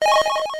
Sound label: tone